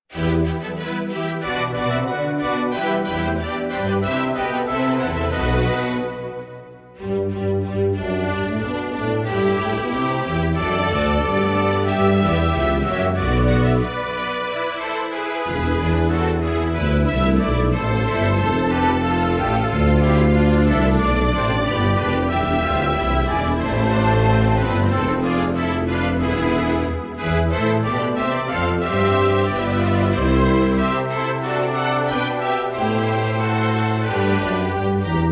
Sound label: Instrumental; Bach; St Matthew Passion